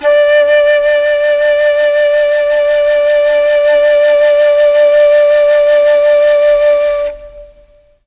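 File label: D3 chamberlin flute, mellotron; CHMBLN FLUTE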